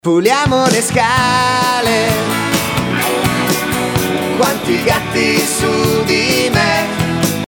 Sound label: Ringtone